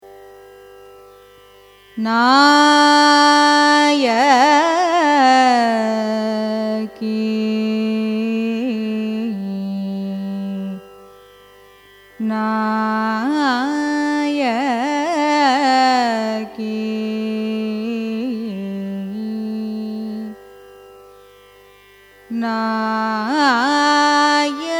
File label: raga nayaki signature; carnatic music